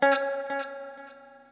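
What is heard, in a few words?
noodle short, guitar; Quantum Leap; 90's Sample CDs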